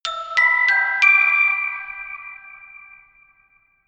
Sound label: notification glockenspiel creepy sequence jump